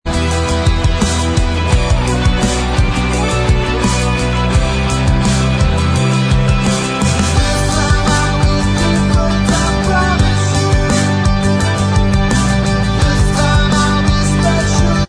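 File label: backing vocals track, backing tracks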